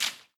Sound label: water footstep